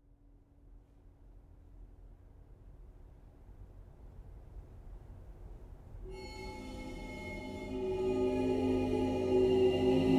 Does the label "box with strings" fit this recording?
Yes